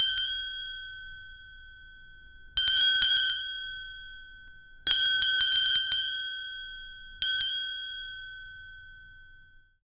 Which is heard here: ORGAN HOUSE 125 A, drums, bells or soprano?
bells